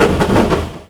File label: rumble short in, Kart